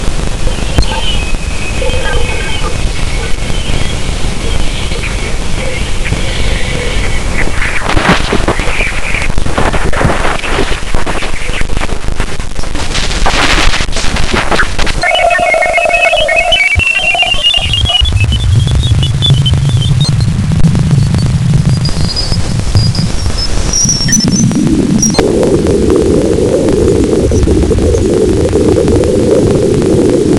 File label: Ganymede Magnetosphere